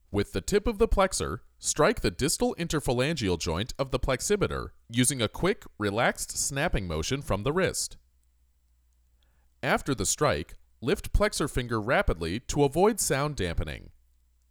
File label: Percussion